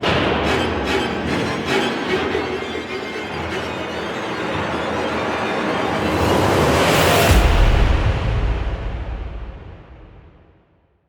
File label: Spawn Music; Warframe; Cinematics; Nightwave; Zeal Preloid